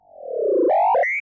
s osc

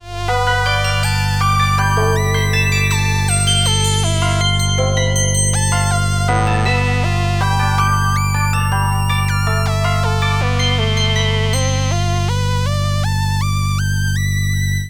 fade in gliss

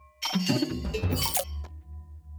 folk

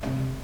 bolero

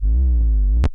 d kik

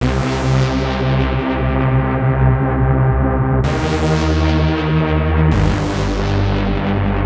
alarm

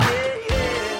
yeayea

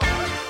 "ohh"

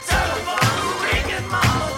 mooo

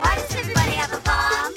bang

